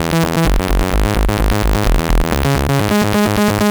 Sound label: saw me good E 129, synth loop; Zero-G; Soundclash; Nu Dance Electro